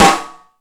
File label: Sluggo Drums, E-MU Pro-Cussion